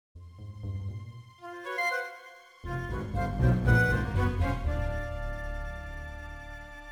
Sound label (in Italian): tensione